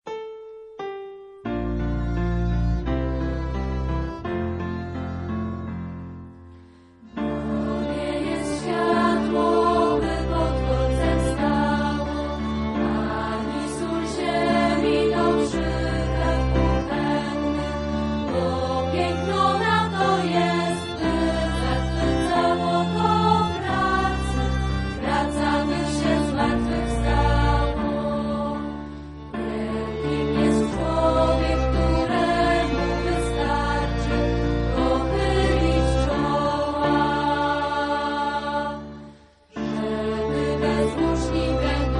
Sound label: hymn